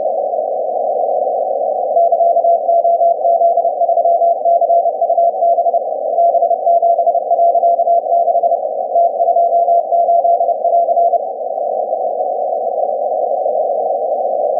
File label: cw beacon; usat3